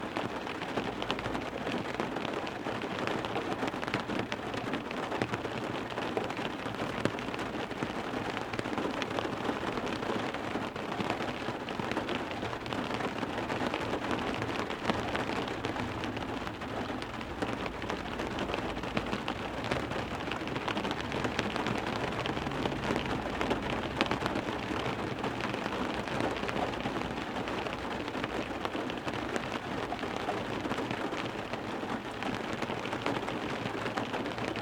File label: Heavy rain drops on a car window, steady, recorded indoors, loop; Sound Ex Machina; RAIN CITY